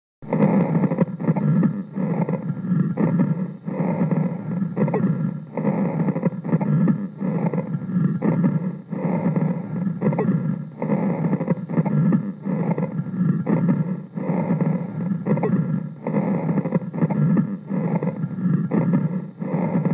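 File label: Rhonchi; Ronchi; simulatorvzorky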